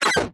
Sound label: Science Fiction Sci-Fi Electronic Keypad Access